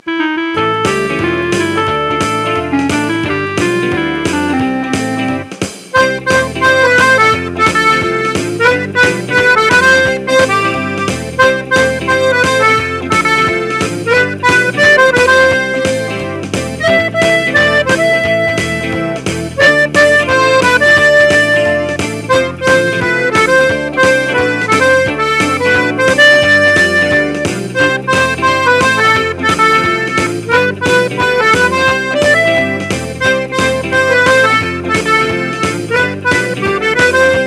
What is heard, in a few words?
rock